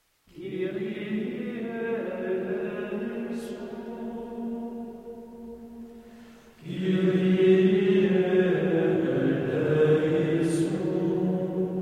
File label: Coro de Monjes Kyrie, Gregorian chant; Kyrie III